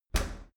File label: dry cleaner open, maschinen tueren